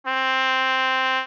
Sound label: trumpet